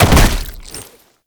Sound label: Land Heavy Flesh, movement; Warframe; Uriel